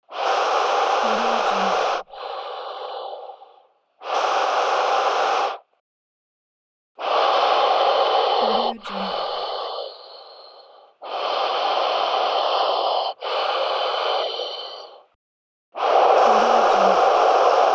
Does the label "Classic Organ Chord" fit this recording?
No